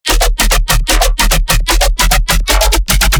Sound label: bass loop messy 150 D#; messybreakfast; Virtual Riot